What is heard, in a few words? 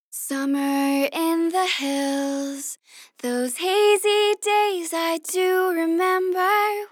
Monody Vocals